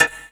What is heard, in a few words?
WHAT, DRUMS PERC; Q Up Arts; Dream Experience